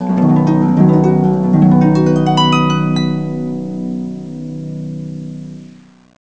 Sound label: Harp; kentei kyoukai imasara hito ni wa kikenai otona no joushiki ryoku training ds